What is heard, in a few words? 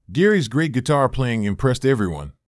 great guitar playing